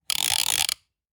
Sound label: Baby Toy Ratcheting Short Fast